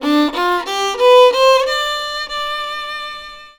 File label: VIOLON B, Indian Traditions; USB Soundscan vol.45; AKAI